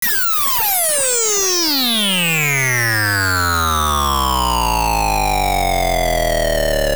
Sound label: Sword Forged; The L2 Sword being forged at the Seashell Mansion